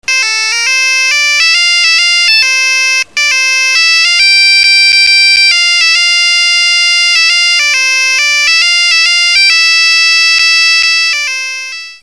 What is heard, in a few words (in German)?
belgien siemens; midi; Polyfonisk musik; Hymnen